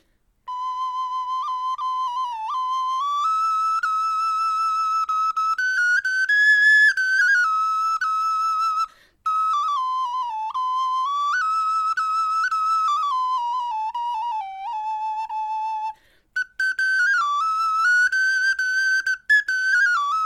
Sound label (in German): melodie